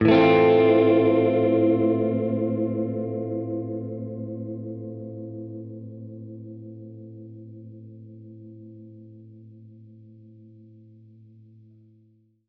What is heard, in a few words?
Gretsch Chord Aminb6, Guitar Chords